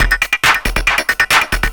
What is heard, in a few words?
percussion loops, Techno; PS-45